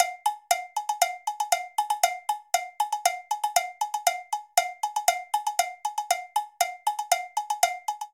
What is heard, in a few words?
Descarga Cowbell 118bpm, Latin Percussion; Ableton; Factory Packs